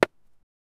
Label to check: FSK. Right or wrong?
Wrong